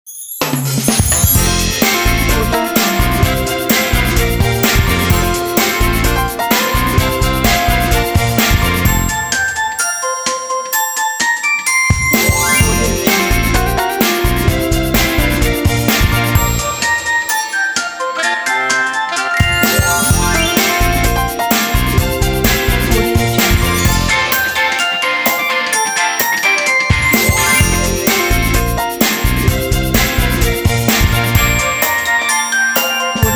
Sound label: winter holyday song